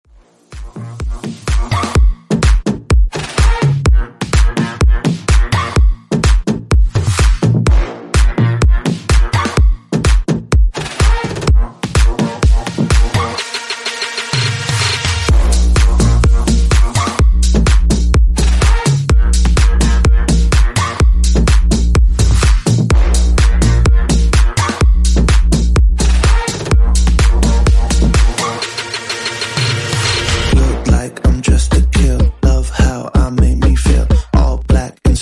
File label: Big Horn Banger